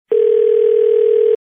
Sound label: beep, calls